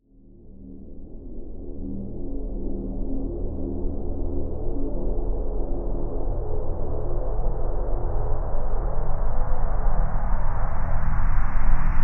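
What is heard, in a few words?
Reverse Boom Hard, Cinematic Metal Impacts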